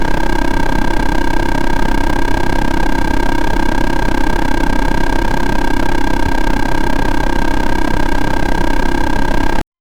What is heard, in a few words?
a continuous retro style, chiptune